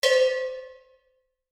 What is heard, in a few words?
cowbell